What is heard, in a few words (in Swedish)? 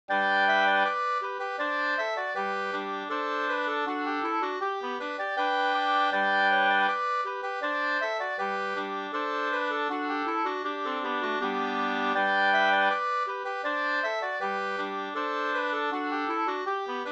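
brudmarsch Kyrkmarsch fr Sodra Kinds harad Västergötland 2 stämmor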